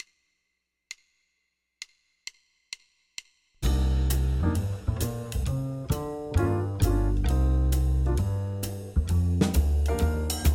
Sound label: blues winds